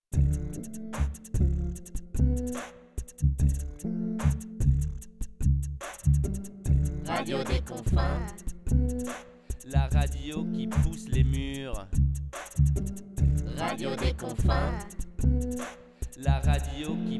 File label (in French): jingle trap